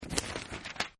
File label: Page Turn; Paper Sound Effects; Page Turn 4; Offices